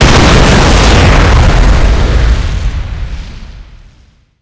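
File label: explosion car distant; modern warfare 2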